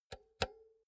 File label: insert cinch hard